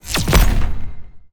ui switch full

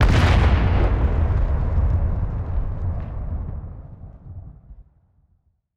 explo

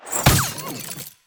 hook rope hit

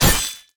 sickle metal

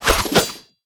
p atk circlesword

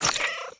ui page in

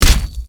axe

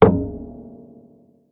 strike harp

